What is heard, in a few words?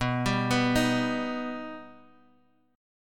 B Augmented B down Guitar Drop D